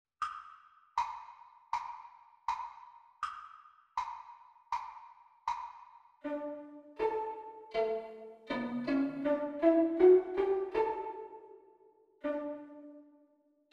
Violin Duet Practice Speed

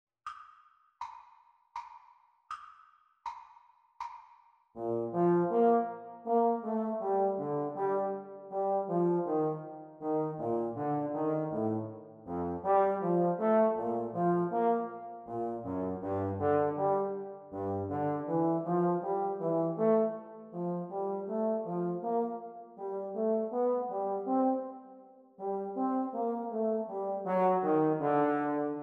Playalong for Trombone, Speed; Exam Speed

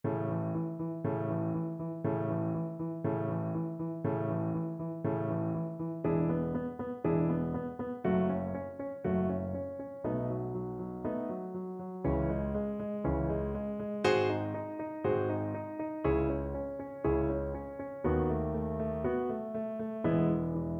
Skips of 7ths Low Voice accompaniment; Lesson IV

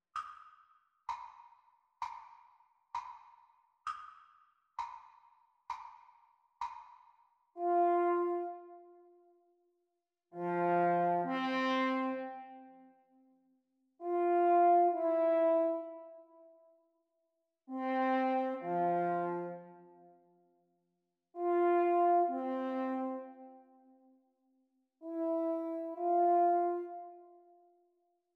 French Horn Duets Playalong Practice

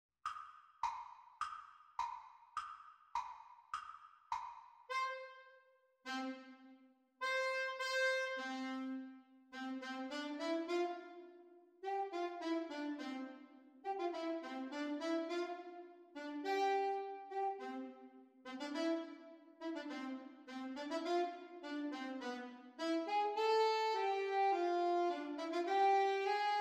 Sax Duets Playalong; Exam Speed; Alto Saxophone duets; Grade 5

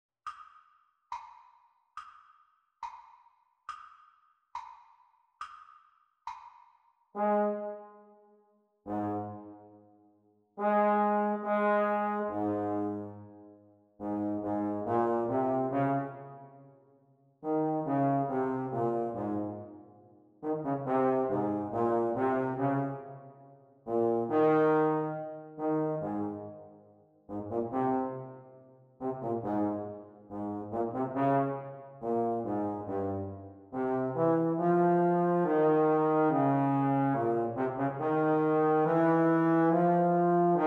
playalong for trombone practice speed

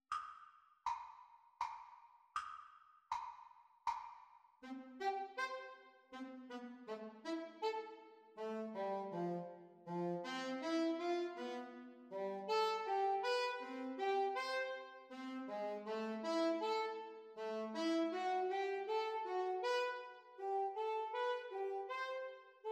Sax Duets Playalong; Grade 8; Alto Saxophone duets; Exam Speed

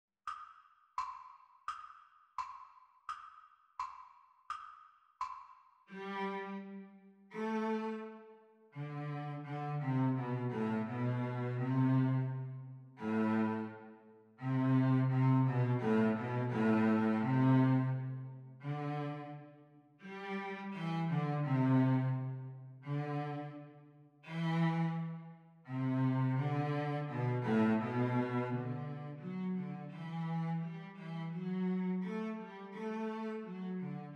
Cello Duet Playalong; Exam Speed

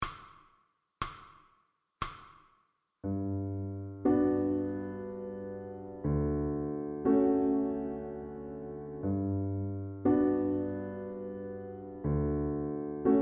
VLN 60; Grade 1; piano accompaniments; Gymnopedie

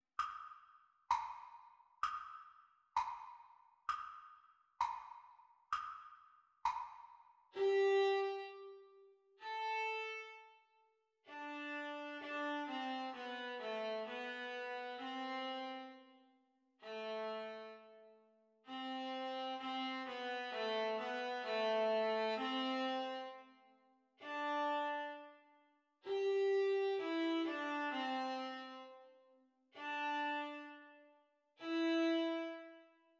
Viola Duet Playalong Practice Speed

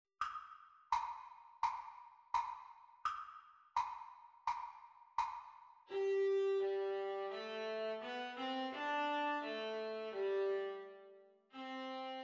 Viola Duet Playalong Exam Speed

